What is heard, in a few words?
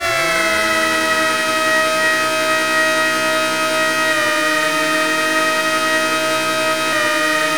BAWLING; AKAI Anime Sound FX